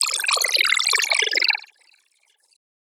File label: SFX Robowater; One-Shots; Ghosthack; Color Bass Essentials